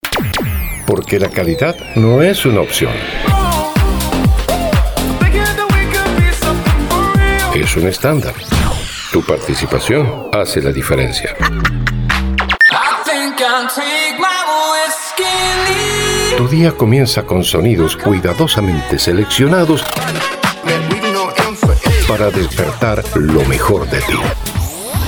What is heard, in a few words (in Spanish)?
Arte Ambient Otono